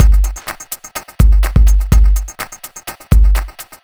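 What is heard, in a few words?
No snare, Drum Loops 125bpm, Nu Dance Electro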